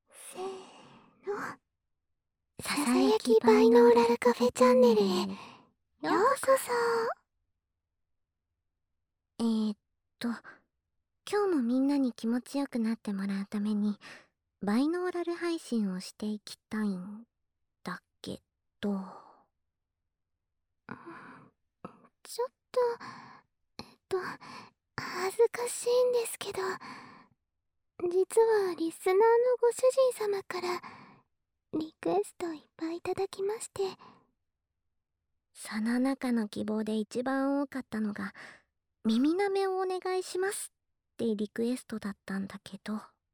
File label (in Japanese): 耳舐めご奉仕配信, ASMR